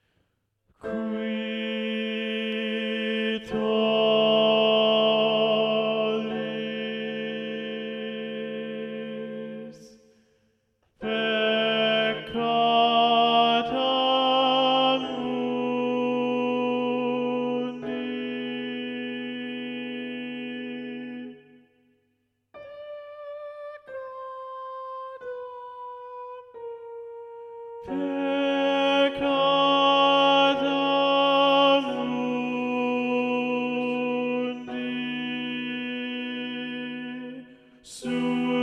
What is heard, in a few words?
Qui tollis (Gloria) - Tenor Predominant; Vivaldi